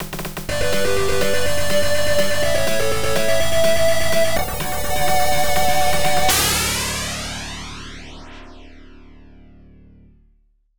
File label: Stage Clear; Winning Stream